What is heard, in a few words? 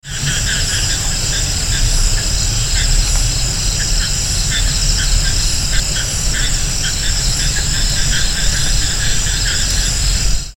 Bufo melanostictus, Thekkady